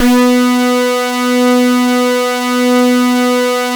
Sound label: LEAD BRS B3; Drums In Your FACE!; LEADBRASS